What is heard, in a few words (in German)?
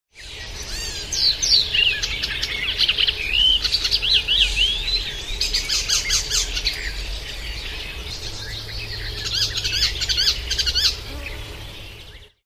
gelbspoetter